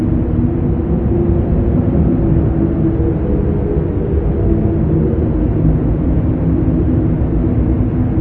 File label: Wind moaning